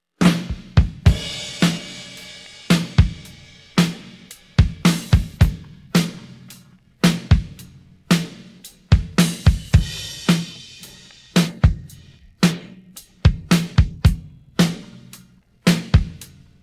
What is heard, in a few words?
drums pattern